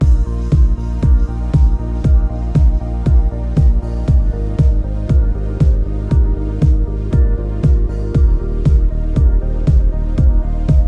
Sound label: Landing; Summer Catchers